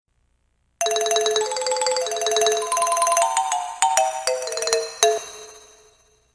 Marimba (five notes); Harvest Moon Magical Melody

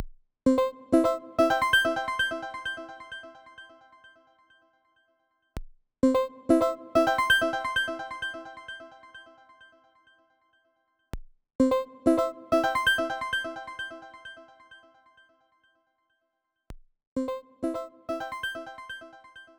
Accomplishment; Kombinera

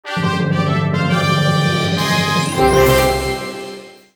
Music Fairytale End; Crash Tag Team Racing

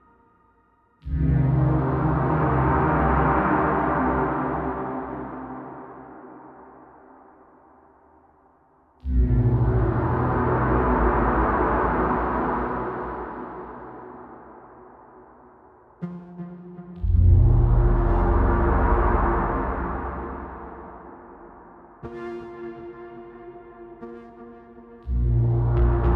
Loop 120bpm; Mysteriblip; jenny leclue detectiv

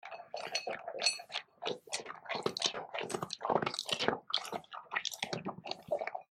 Eat; Granny Chapter Two

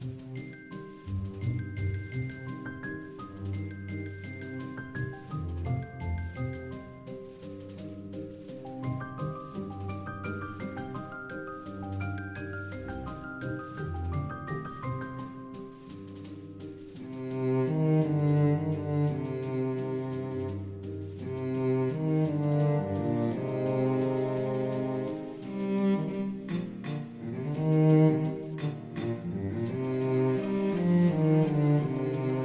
Music Tutorial Loop; Curse of the Sea Rats

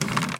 Vault Rotating; Still Life

